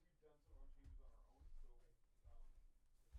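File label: Acoustic Guitar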